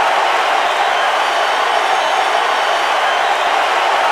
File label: BBall Crowd Cheer Loop; SFX Kit; Crowds